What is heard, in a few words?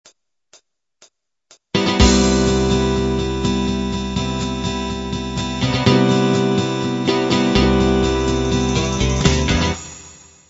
light rock intro